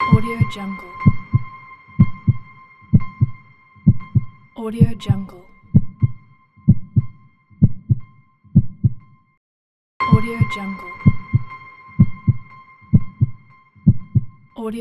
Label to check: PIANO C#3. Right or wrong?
Wrong